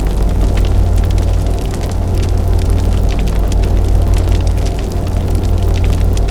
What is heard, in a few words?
Enemy Fire Spell Travel Loop; AudioWizards